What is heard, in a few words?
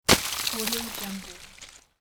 icy impact slide